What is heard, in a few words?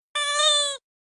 cute squeak